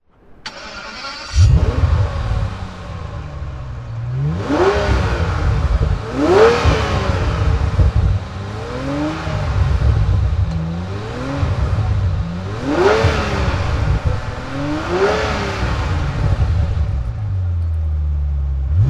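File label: aston martin db7 vantage convertible throttle, engine sound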